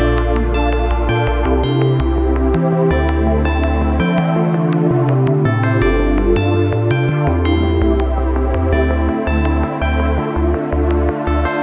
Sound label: healing; ゆめ2っき